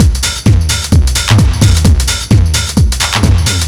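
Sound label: drum loop 130, left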